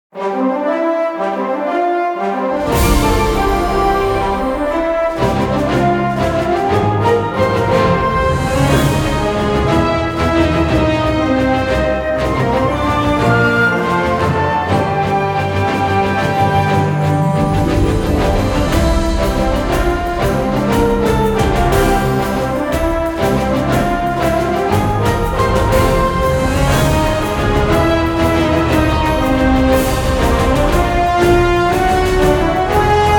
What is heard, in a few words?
Main Theme Heroic March 120BPM; ps4; xbox series xs